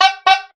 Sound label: silly 3 squeek horn